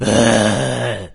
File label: zombie sight; doom3; fatzombie